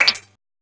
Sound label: BUBBLEZ HI; Distored Reality